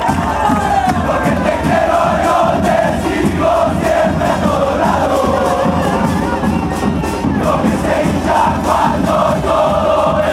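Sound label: y te alentamos pasos al costado, football chant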